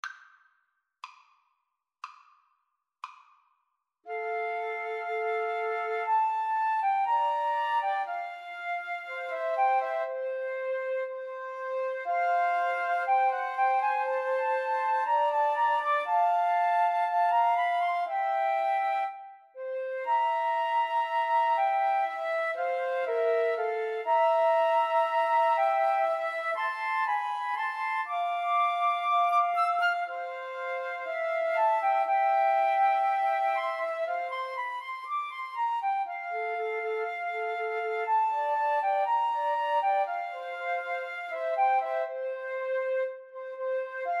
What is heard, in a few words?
romance, Flute Trio